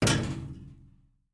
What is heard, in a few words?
Flash Hand; Game rip; MiSide